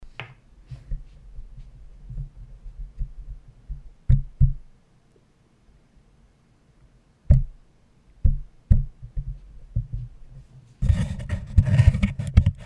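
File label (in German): irig mic field handgeräusche am ipad und am mikro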